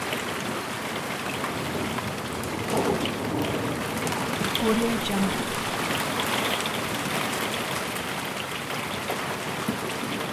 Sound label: weather hailstorm heavy loop